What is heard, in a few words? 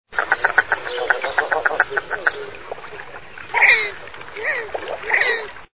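red-breasted merganser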